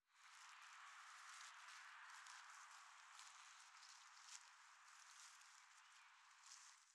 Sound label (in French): Bord De Route Allemagne Pas Herbes Sèches Respiration